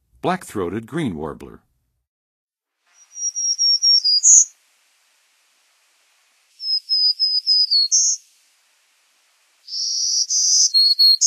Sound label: Black-throated Green Warbler; N. America; Songs of Birds of North America; Western USA Songbirds